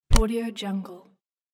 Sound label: tick